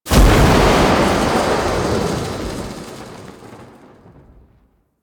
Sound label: Void Dragon Firebreath Cast Lightning; Enemies; Abilities; Warframe; Duviri